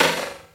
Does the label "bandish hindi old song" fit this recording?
No